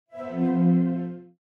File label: Item Removed From Cart; Blutag; Dreamr